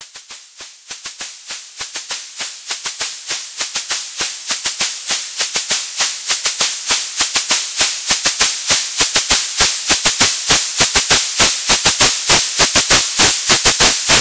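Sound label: pulse pattern, rhythm; nyquist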